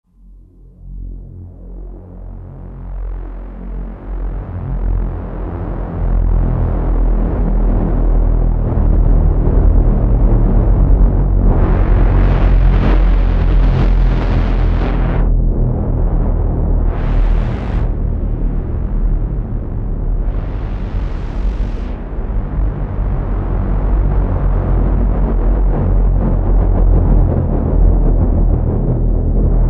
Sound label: Pan, Roland SR-JV80-04 Vintage Synth; Airdrome Pan